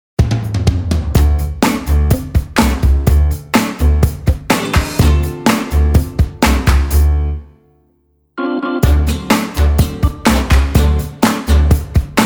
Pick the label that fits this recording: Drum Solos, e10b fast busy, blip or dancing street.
dancing street